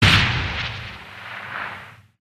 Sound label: troika fire stop, turrets; enemy; gow